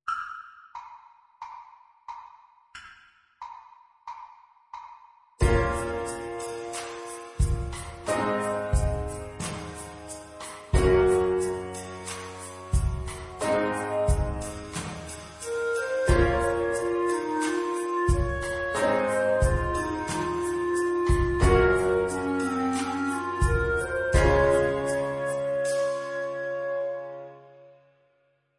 Low fingers accomp, viola

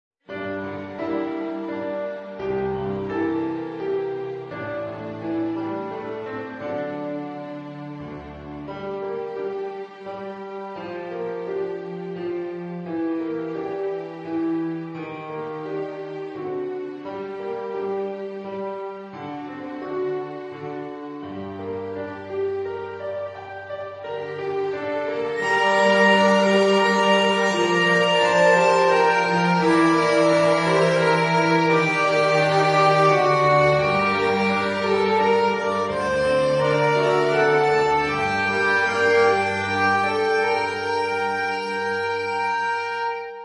Dog tired accomp, viola; hey presto for viola book 1 bronze

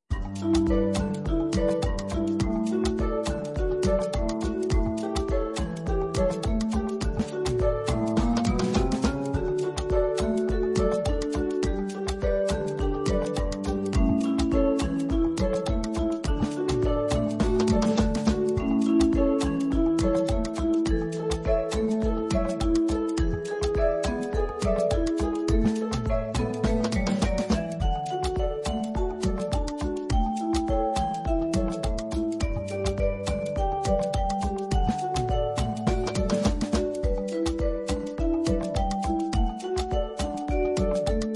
Calypso accomp

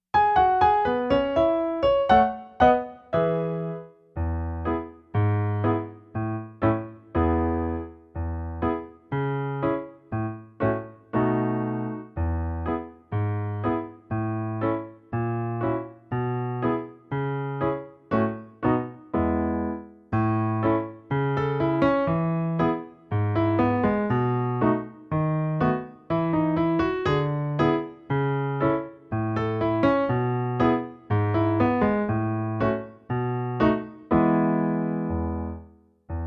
Ragtime accompaniment

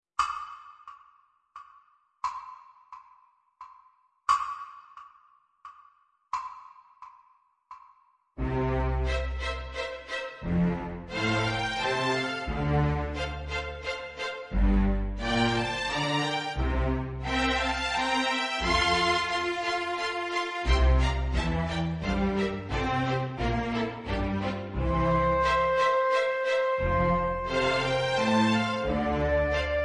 3rd pos ex 2 accomp, viola

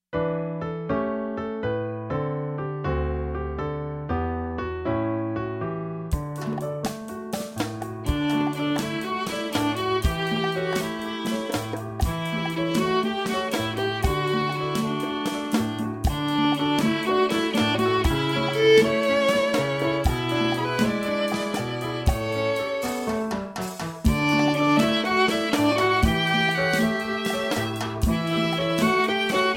Rumba

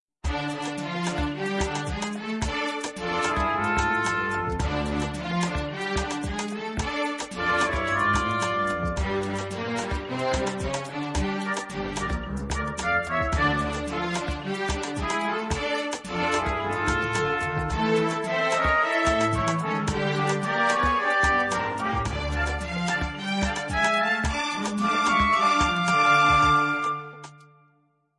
accompaniment, violin; heypr for violin bk 1 bronze

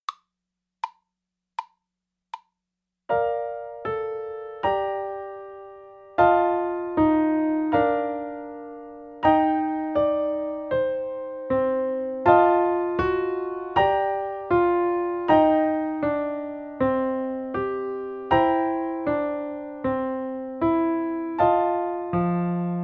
Bb major scale accompaniment

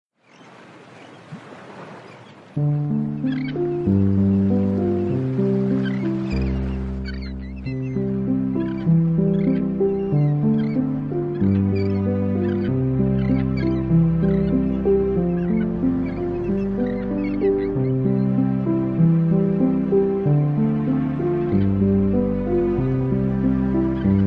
accomp, viola; hey presto for viola bk 1 bronze

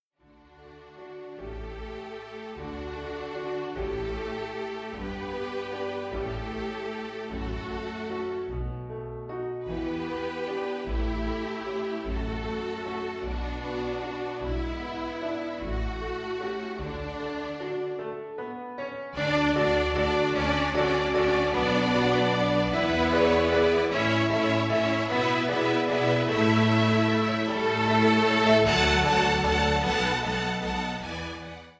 sad waltz

